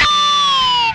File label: whammy fx, guitar; 90 s Sample CDs; Zero G - Funk Guitar